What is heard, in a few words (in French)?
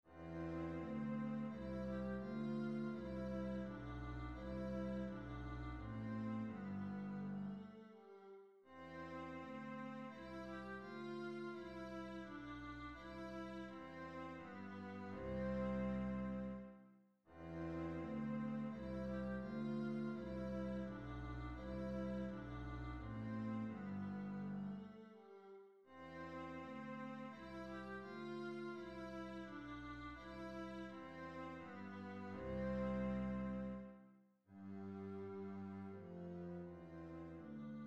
menuet majeur; bach johann christian